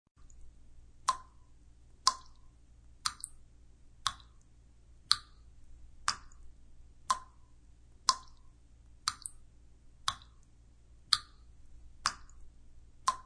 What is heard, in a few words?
Series of water drips; Constant Drips; Dripping